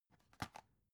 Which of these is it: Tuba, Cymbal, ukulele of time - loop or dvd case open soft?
dvd case open soft